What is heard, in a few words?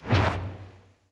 swing slow; smite; odin